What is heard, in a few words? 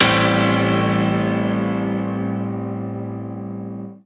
one hard house piano 7th chord; Piano and Epiano